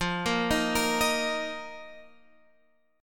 F 7th Suspended 4th F down Guitar Standard; arpeggiated; JGuitar

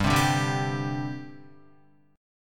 G Suspended 4th, Guitar; strummed; 2,3,2,0,0,3; 4F,4A,5C,5G,5C,6E; JGuitar

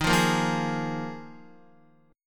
Eb Suspended 2nd Suspended 4th, Guitar

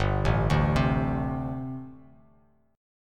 G Suspended 2nd Suspended 4th, Bass Standard; Gsus2sus4 arpeggiated; JGuitar

